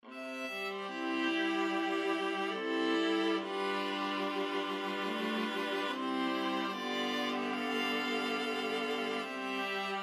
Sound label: viola ensemble